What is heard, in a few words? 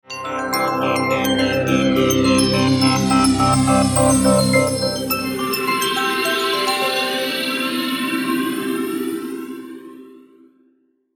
Logo Bells Piano Sweeper Flange Slow; Sonic Logo